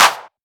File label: Clap; FLPRO